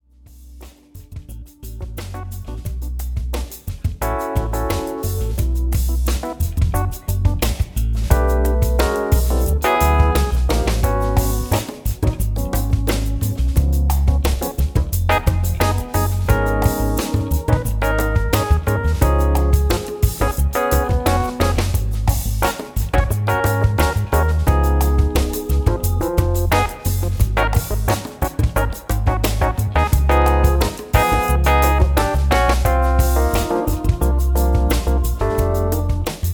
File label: latin funk minor